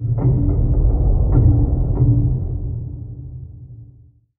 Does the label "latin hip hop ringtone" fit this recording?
No